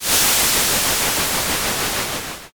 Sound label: grill